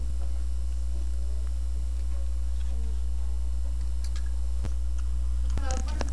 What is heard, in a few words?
camera